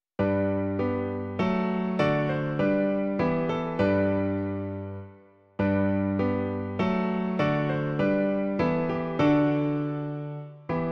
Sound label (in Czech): klavír, Klavírní verze; Již zpěv, prosby, kázání